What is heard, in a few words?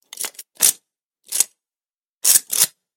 reload; TRIGGERBOXING